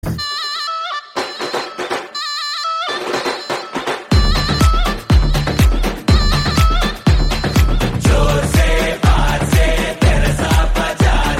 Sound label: Mass Beat Ringtone; Republic; Telugu